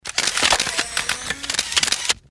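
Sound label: Many Cameras Going Off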